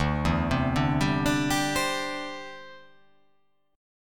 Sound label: D 7th Suspended 2nd Suspended 4th D